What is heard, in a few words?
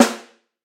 thick snare drum a sharp key, snare drum sample tuned to the A# note